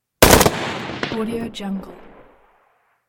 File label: shot burst